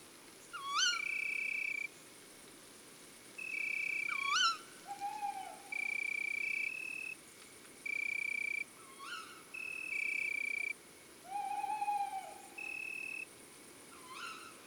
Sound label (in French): Chouette hulotte femelle Chants d'oiseaux des bois et des forets